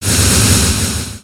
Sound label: Ice Storm